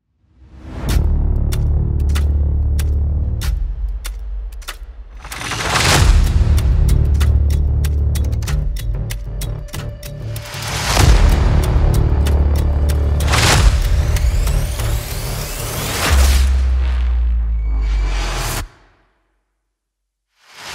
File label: Cinematic battle; نبرد و جنگ سینمایی